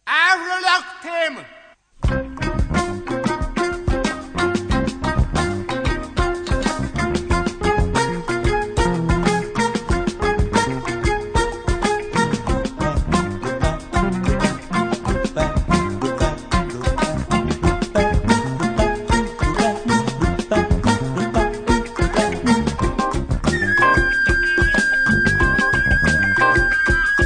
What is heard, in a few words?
Afro Rock Theme